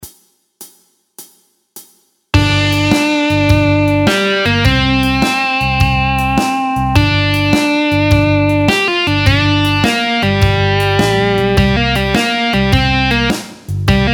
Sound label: blues solo, guitar; teiban